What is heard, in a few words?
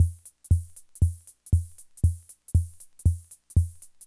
Loop, classic machines, rhythm77